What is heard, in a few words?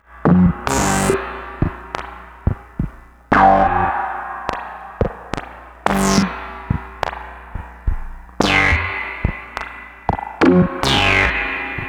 moog spring